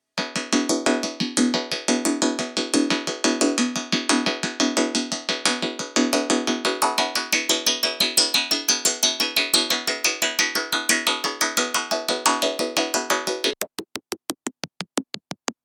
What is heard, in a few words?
OB-8 Metallic Roland SRV-2000